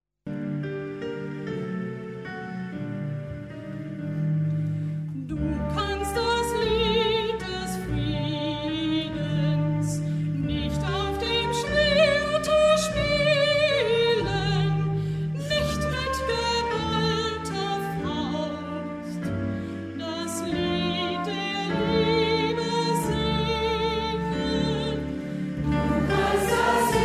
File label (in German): gospelchor